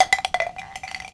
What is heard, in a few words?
shotgun shell, tink; weapons; Counter Strike Model Pack; CS2